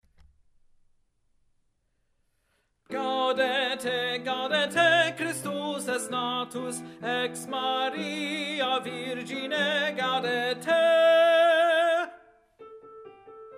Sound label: Tenor; Gaudete! Christus Est Natus, Pies Cantiones